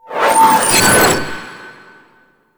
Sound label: Glass Shank Sweep; Warframe